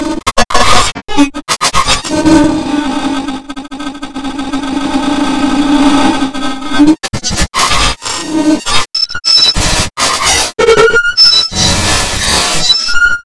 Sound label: ventilator